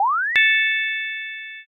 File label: light magic